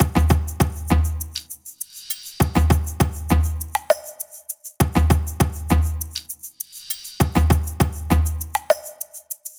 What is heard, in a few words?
perc loop 100, Pop, Drums; Loops; Slate; SD PASADENA Pop Sample Pack